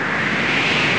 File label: wind; ST-XX Amiga Soundtracker Sample Packs